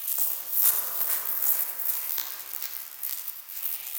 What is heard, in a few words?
sparse soundscape samples; ReLooped